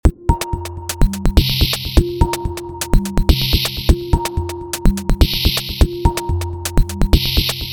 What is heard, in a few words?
loop